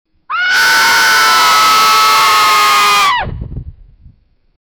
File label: cartoon character scream; falling